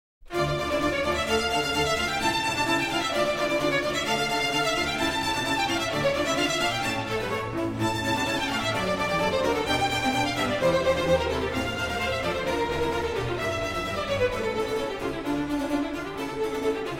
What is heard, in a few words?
Concerto for Strings and Continuo in D minor